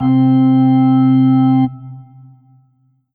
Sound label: Alesis Sanctuary QCard Promenade C3